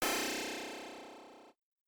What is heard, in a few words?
arpeggio yes